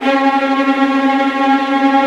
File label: VIOLINT D#, Synclavier Strings; ILIO